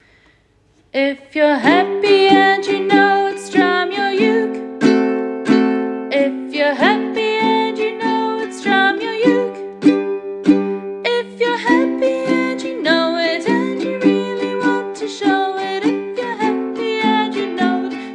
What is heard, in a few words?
Uke; if you're happy and you know it; Uke book